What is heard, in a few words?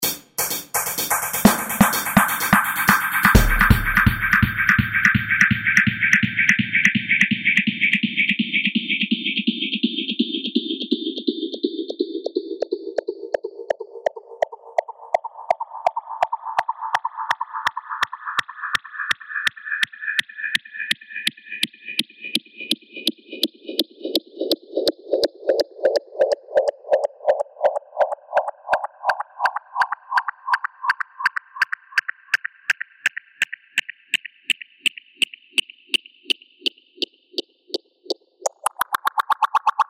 looping dub delay freqshift